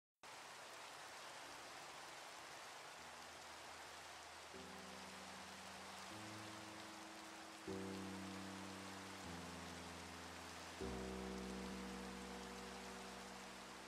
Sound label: Music Slowed Down With Rain; Minecraft Music Slowed Down